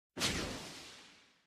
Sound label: Flare Shot; Helicopter